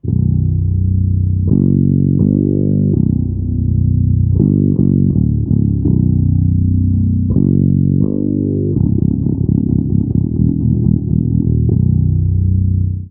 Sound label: ATM